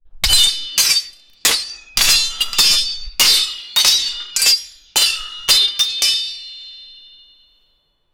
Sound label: a large group of medieval, swords